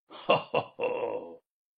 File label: laughing lol; talking ben